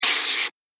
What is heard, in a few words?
Pencil On Paper; Writing Sound Effects; Foley Sound Effect; Andrew Fleming